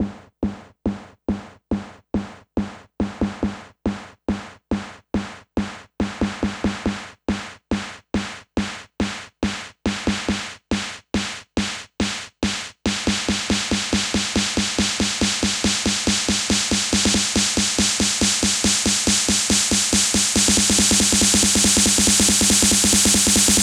140BPM SNARE 16 Bars; Crushy; Vengeance Samples; Snares FX Pairs

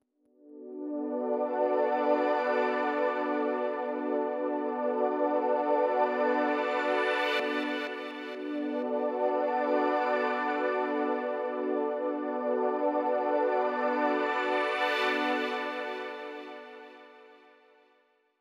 126BPM Pad Root B; Vengeance Samples; Klondike Kit

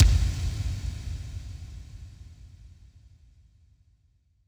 bassdrum reverb FX kick; Vengeance Samples

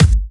Hard Kick; Vengeance Samples

